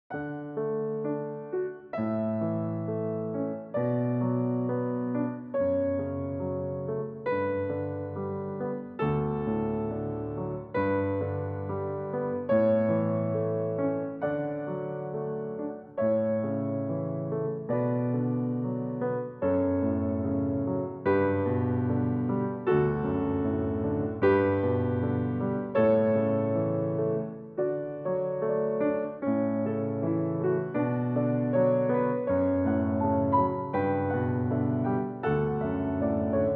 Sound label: Canon en re, piano; Pachelbel